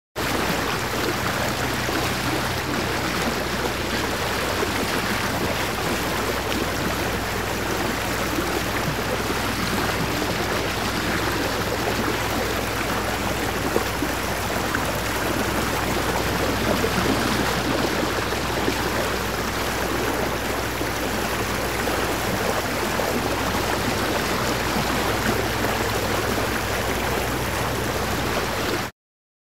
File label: Water Boat Cruise